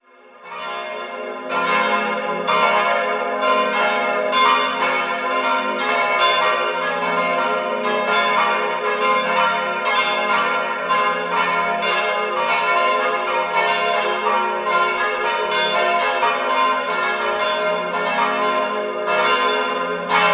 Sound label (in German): Titting, glocken